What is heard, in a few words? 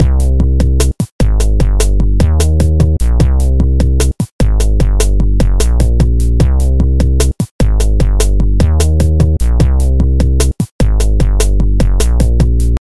funky sample